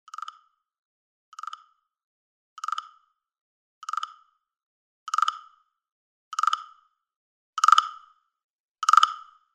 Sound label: Bamboo Wake up Alarm; Samsung SGH-F310 Serenata; Alarms